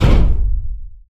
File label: metal robot impact med step; ProSoundCollection v1 3